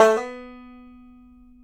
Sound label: BANJO NOTES, B 3; Sampleheads; Whole Lotta Country 1 & 2